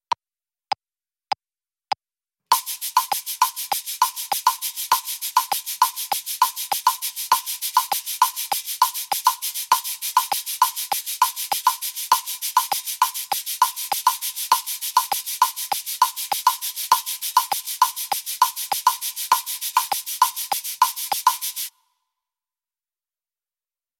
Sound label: Cabasa Foot pedal; shakers